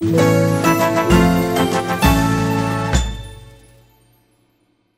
You Win; ArmyRaid; Interface and Item Sounds

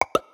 Special Pop; ArmyRaid; Interface and Item Sounds; Pops

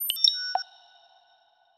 Future Sounds, Interface; ArmyRaid

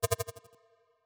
Future Sounds, Interface; ArmyRaid

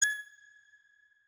Future Sounds, Interface, Futuristic; ArmyRaid

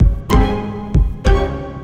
Danger loop; ArmyRaid; Interface and Item Sounds

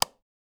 Switch, Interface, Switches Buttons; Interface and Item Sounds

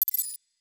Future Sounds, Interface, Futuristic; ArmyRaid

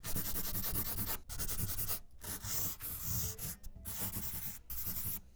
Writing; ArmyRaid; Interface and Item Sounds

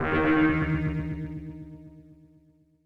You Lose; ArmyRaid; Interface and Item Sounds

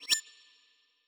Future Sounds, Interface Futuristic; ArmyRaid

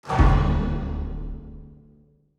Queue, Interface; ArmyRaid; Interface and Item Sounds

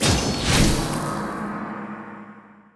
Oriental, Interface; ArmyRaid; Interface and Item Sounds

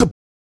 Mouth Interface; ArmyRaid; Interface and Item Sounds

